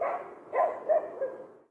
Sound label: dogs